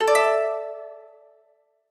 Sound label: notice; freetel